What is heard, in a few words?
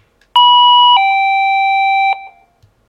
Ding Dong Chime Tone